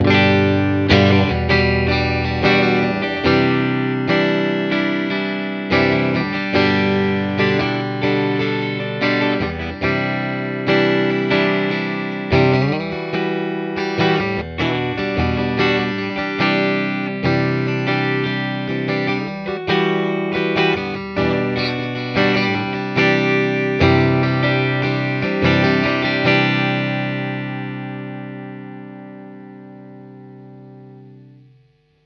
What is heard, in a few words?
Chords; 70th Anvers